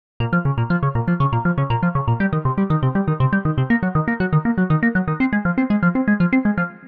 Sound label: step trigger; the analog sound waves